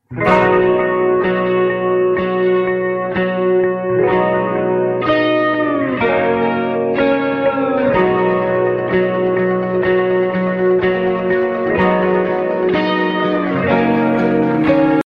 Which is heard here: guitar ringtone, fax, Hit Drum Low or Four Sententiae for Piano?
guitar ringtone